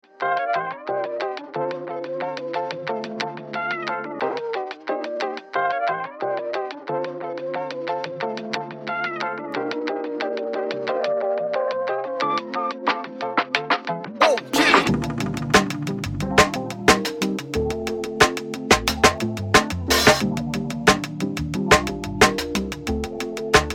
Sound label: bomb planted